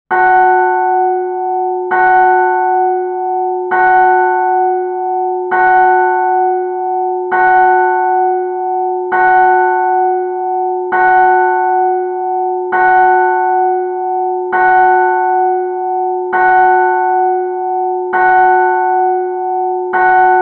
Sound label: Bells 12 OClock